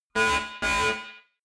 sci fi alarm horn cackle double; soundpool

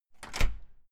entrance door close slam outside

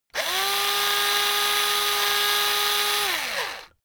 electric drill screw out long; soundpool

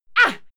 female fight attack hit short; schreie; menschen

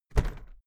briefcase plastic drop soft; industrie wirtschaft; bueroarbeit

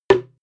impact tub short; soundpool

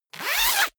zipper pullover jacket open fast; objekte; reissverschluss

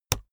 keyboard laptop enter push hard

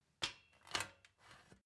step ladder metal scrape single

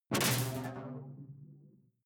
sci-fi alien splash glass; swooshes-swishes

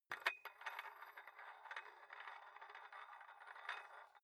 dumbbell turn nut thread slow; sport freizeit; fitnessstudio

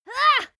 female moan pain short soft; koerpergeraeusche; menschen; soundpool

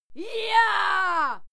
female cheer yah; schreie; menschen

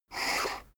poker chip slide table short; soundpool

